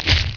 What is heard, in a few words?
munch; mk2